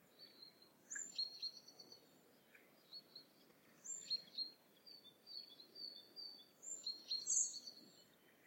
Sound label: dawn, daycycle; cod4; openwarfare